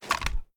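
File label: reload fast extended magazine end; gmod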